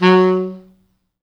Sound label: F#2, Pop Brass; Best Service ProSamples vol.19; AKAI